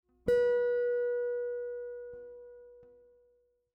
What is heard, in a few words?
pre bend, guitar; guitar string bending